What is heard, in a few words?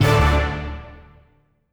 Orchestra 3rd Star